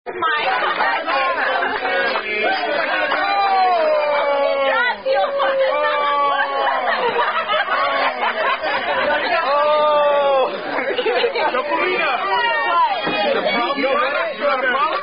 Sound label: Happy Birthday (Standard) Sung By Wait Staff; Chili's